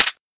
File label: deagle close, weapons